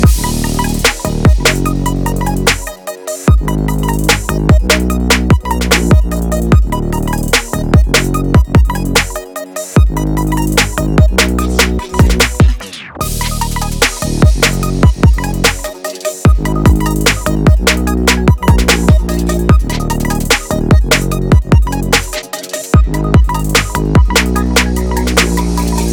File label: urban hip hop